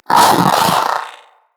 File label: Companion Vocal Bark Double, Pets; Zanuka